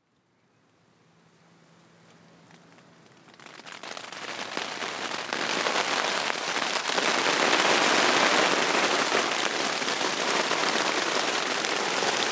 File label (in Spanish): AMB EXT DIA CIUDAD LLUVIA bajo toldo